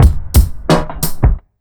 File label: 88 BEAT, Pure Electro, BEAT MIX; USB Soundscan vol.03; AKAI